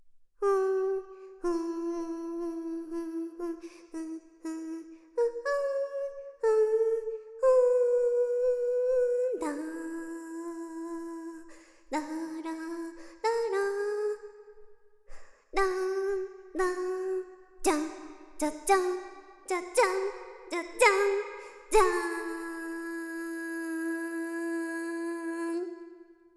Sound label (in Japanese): メインテーマ エフェクト; メインテーマ2 エフェクトver